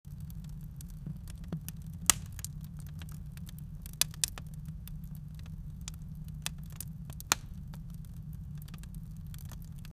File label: a gentle crackling fire